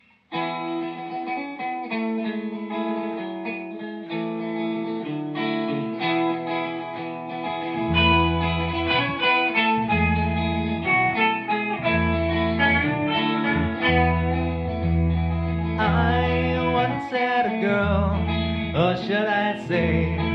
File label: Pirate Recording